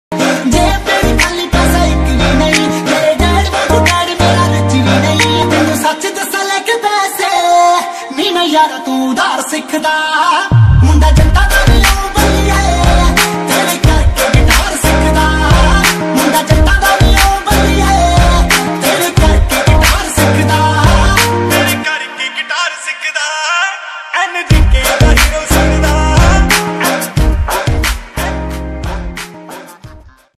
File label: punjabi ringtone